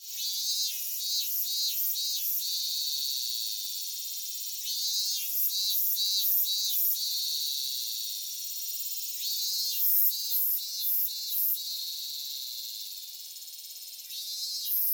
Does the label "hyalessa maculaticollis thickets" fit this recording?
Yes